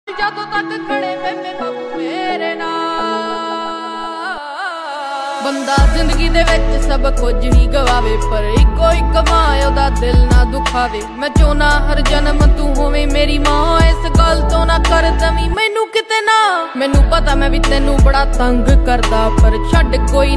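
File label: new Punjabi ringtone, mother love ringtone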